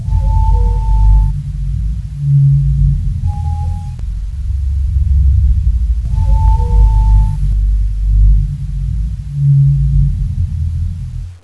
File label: tank groan; dod